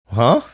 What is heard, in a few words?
"huh"